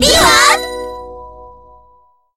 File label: clear bonus